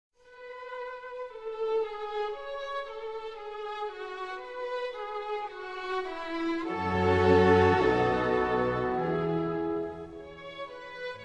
Concerto No. 5 in A Major for Violin and Orchestra